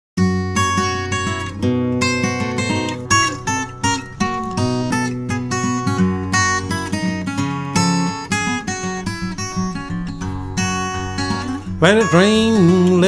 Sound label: Deep river blues